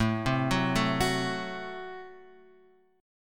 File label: A Minor 6th A; Am6 Chord {5 3 4 2 X 2} arpeggiated